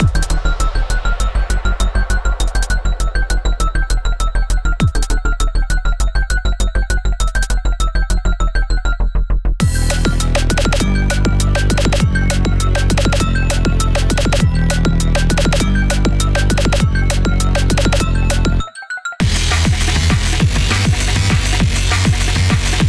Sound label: Instrumental; Friday Night Funkin' - Rappers 'n Skaters